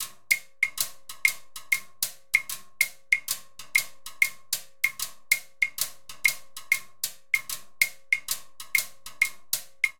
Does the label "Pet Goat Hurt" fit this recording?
No